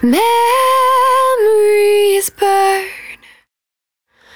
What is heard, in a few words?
110bpm Ab, Velvet Vocal; Zero-G